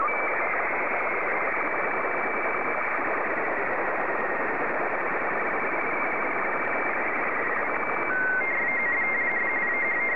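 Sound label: Robot 8 black and white, slow-scan television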